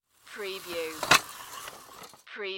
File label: Croquet Cart Roll